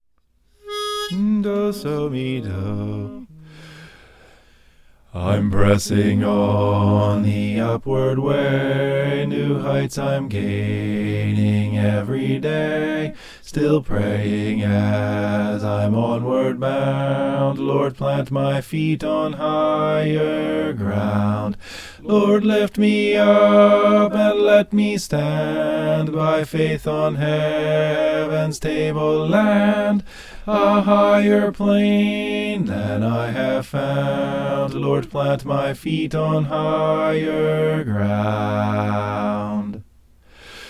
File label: bass